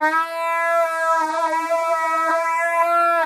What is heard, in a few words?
fly buzzing